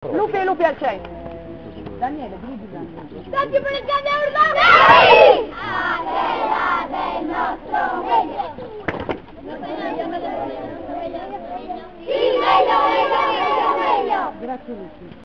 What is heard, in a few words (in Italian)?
grande urlo; nuoro